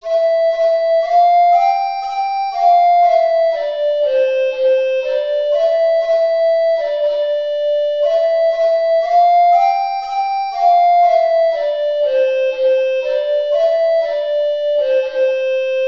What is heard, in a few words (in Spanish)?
Himno a la alegría - melodía, flauta dulce